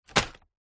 plastic bag thick set down or drop; foley; zapsplat

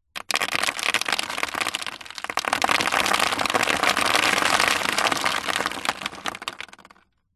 stones small debris pour tip into cardboard box; foley; zapsplat

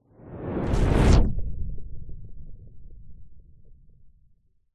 cinematic whoosh powerful underwater; sound design

